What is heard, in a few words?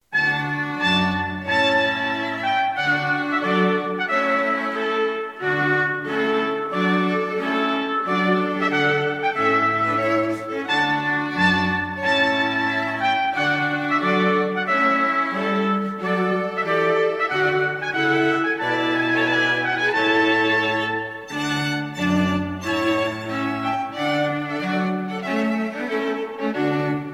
trumpet tune